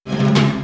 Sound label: Fill 128 BPM; Vengeance Samples; VEH1 Loops